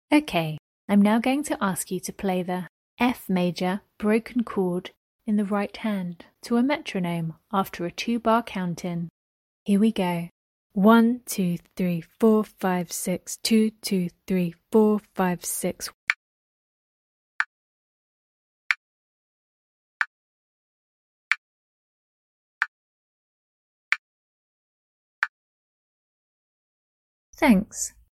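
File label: F major broken chord right hand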